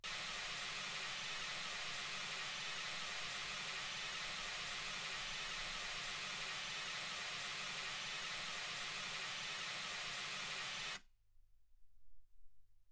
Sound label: mls16 48kHz